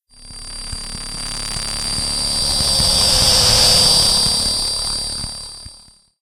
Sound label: tele idle, poltergeist; Soundscape Overhaul; monsters